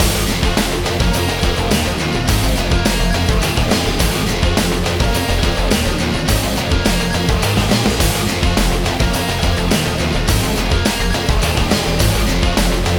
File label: BGM Kowloon Park